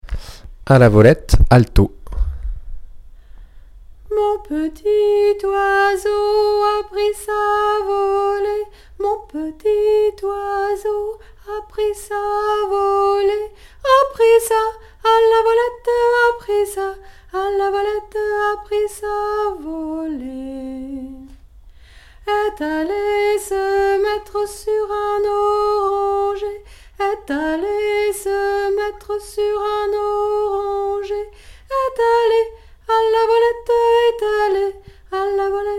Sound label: alto; A la volette